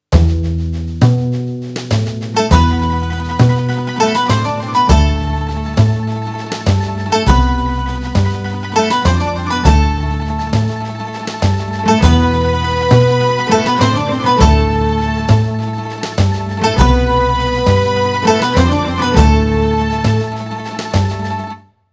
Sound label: acoustic logo